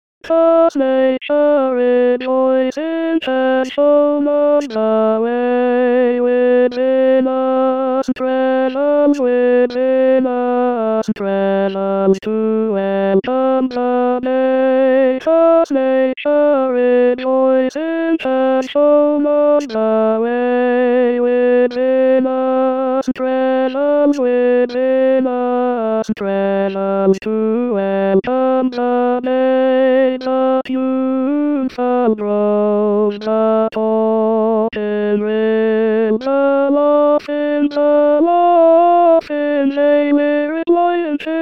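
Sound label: voix chorus tenor; purcell; ode 1694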